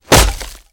Axe Chops Wood Heavy

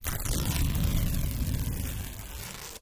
tear, fabric

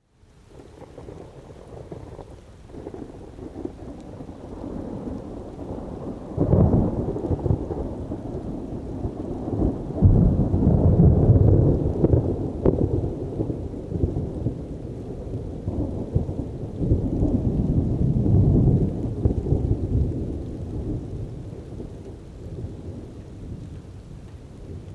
rain thunder; rainfall

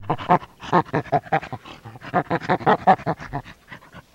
Chimp Ha Ha Laughter

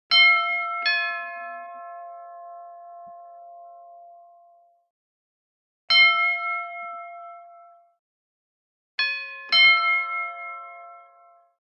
Doorbell; Household Doorbell

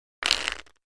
Cards Shuffle Deck; casino games

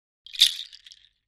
Shaker Bracelet Natu, percussion shaker

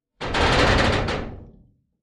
door creak med, squeaks

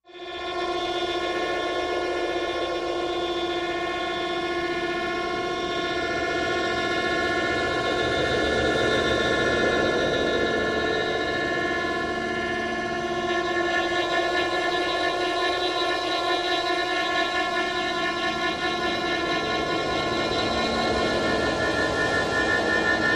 Fire In Hole Machine, industrial

